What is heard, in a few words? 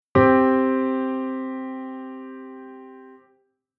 Chord Interval P5